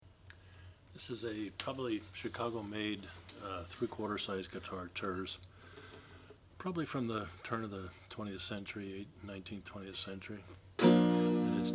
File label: lyon healy cincinnatus terz 3 natural, Guitars Acoustic; 3/4 Size "Terz" Guitar c 1905